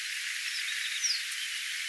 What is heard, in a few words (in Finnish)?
voisiko ensimmainen aani olla pikkupajusirkun aani; voisiko olla pikkupajusirkku?